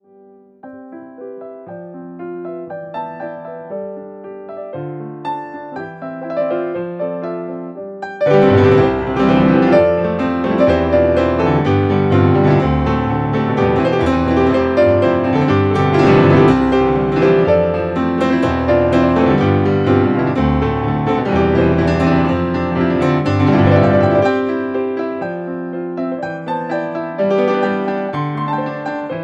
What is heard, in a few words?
Piano